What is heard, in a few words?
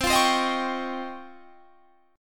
C sharp Major Sharp 11th C